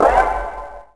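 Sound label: Block Puzzle Done; Finding Nemo; XBOX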